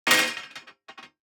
DICE on Metal, Throw and Roll, Standard, Four Dice; Samples; ArticulatedSounds; DICE Sfx Party (Extended)